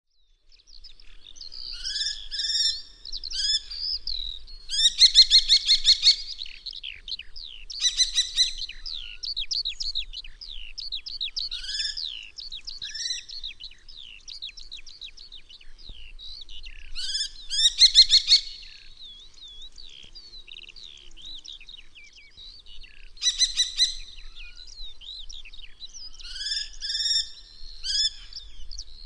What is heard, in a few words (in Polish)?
pustulka, birdsounds